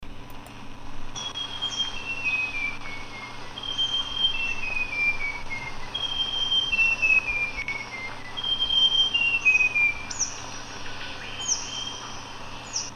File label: Plain gerygone